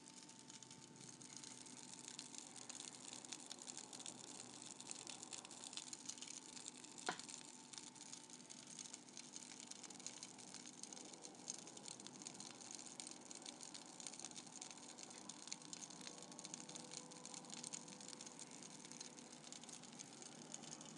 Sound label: rice vermicelli in hot water; guess that noise